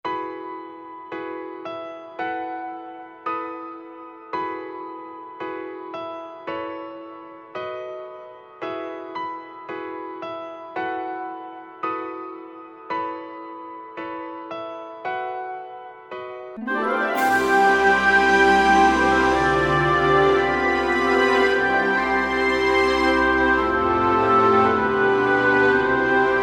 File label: piano